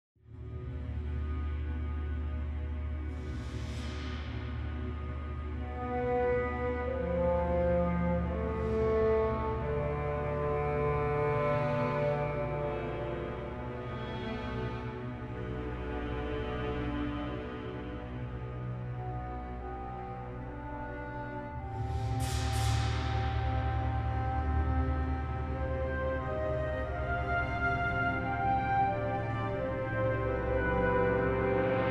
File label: Strategy Ambience; Master of Orion